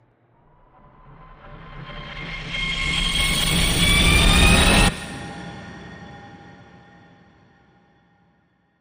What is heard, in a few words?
Intense Riser